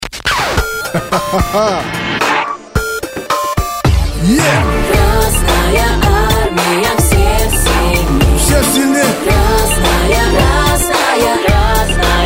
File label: pop